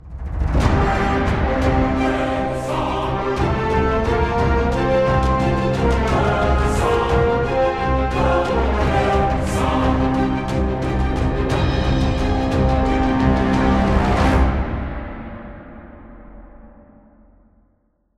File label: win; stingers